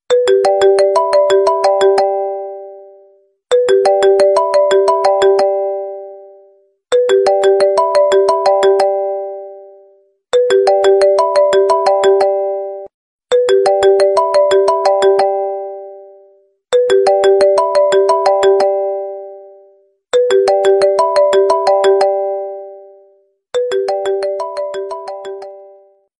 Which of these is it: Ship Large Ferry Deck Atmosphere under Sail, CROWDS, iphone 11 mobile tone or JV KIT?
iphone 11 mobile tone